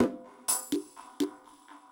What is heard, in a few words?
PERCUSSN ETHNO 125, Drum